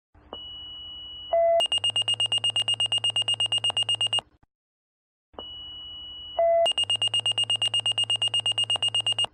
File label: Pager Alert